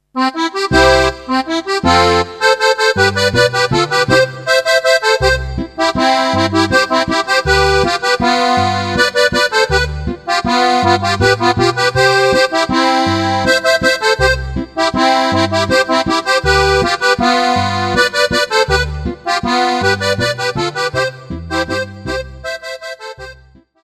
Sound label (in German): landler